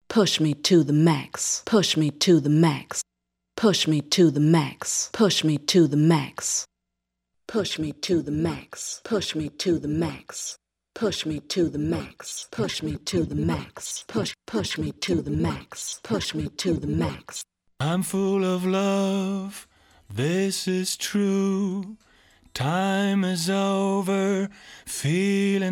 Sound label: Voice Transform, Roland SRV-3030; Preset